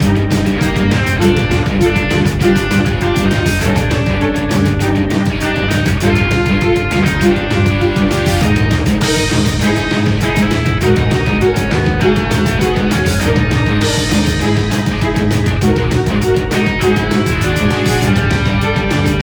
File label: loop 200bpm; finalboss phase03; chicory a colorful tale; ps4